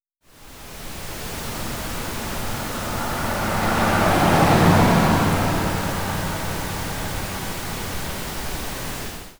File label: Car passing pink